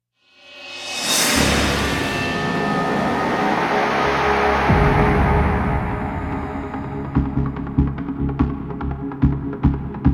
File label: Combat Dogfight; Elite Dangerous